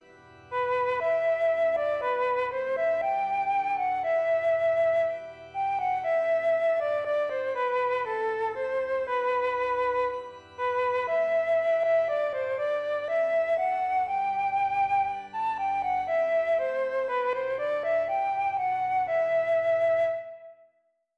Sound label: Lord Keep Us Steadfast Descant playback flute